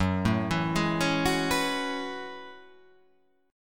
F sharp Minor 11th, guitar; chordshape; down

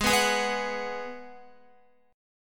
G sharp Minor 7th G sharp, Guitar; G#m7 strummed; x,11,11,13,11,14; JGuitar

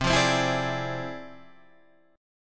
F Minor Major 13th, C

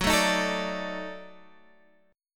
D sharp Minor Major 7th Flat 5th F sharp

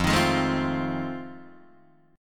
F 7th Sharp 9th, Guitar, Open D

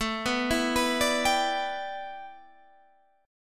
A 7th Suspended 2nd Suspended 4th; A7sus2sus4; arpeggiated

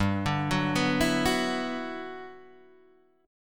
G Major 13th, Guitar DADGAD

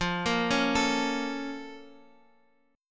A sharp Minor Major 7th

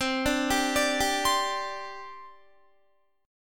C Suspended 2nd, C, down, Guitar; Csus2; arpeggiated

